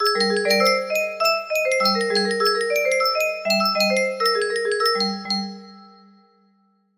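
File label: music box melody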